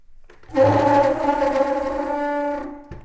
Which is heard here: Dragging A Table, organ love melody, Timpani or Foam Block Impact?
Dragging A Table